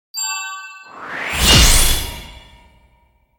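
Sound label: Pack Upgrade; Yu-Gi-Oh! Master Duel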